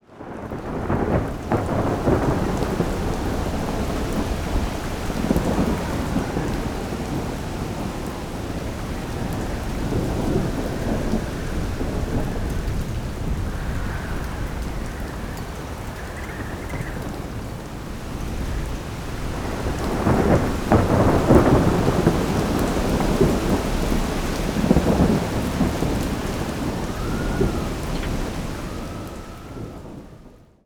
Weather Storm Exterior

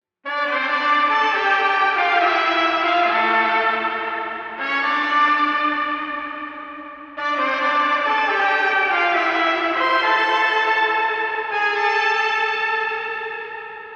Trumpet MOD

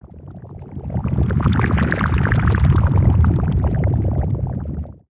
Move Gore; Tsugi

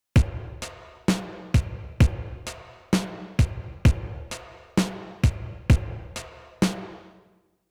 Drums Pro R 2 Plate

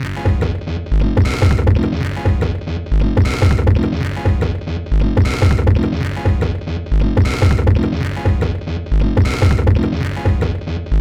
Modular Samples; sampling instrument; Rando by Monkeyc

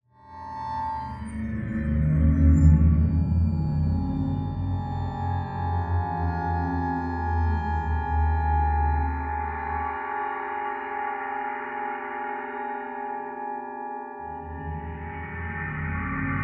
Cinematic Eerie Scene

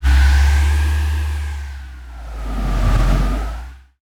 Move Air; Tsugi

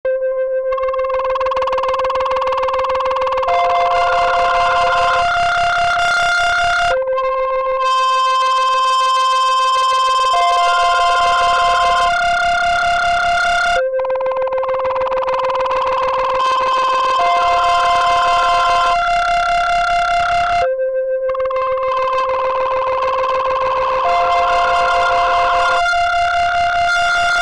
Old Driven Synth; Circuit mode; MIDI; melodic

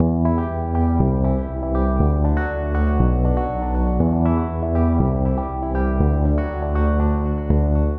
loop alt alchemy w bass on low note

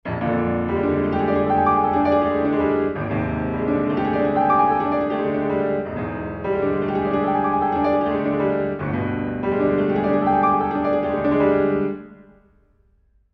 Steinway B room

